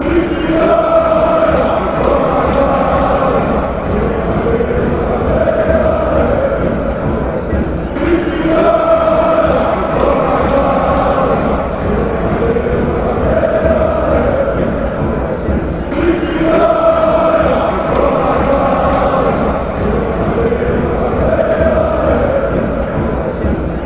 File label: panathinaikos chant